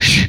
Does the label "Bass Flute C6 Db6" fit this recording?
No